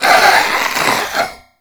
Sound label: Head Shake; Warframe; Stalker